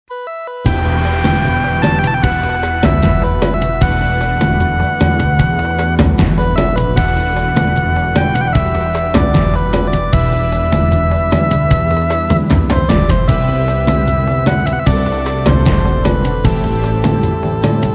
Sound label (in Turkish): melodi